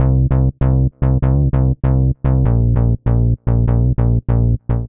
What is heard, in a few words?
Warm Ladder Bass C minor 73 bpm; MIDI Clips; Bassline; Ableton; Factory Packs; Chop and Swing